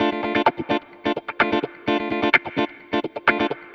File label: Electric Guitar Kit D min, 128BPM; Vengeance Samples; Special Sounds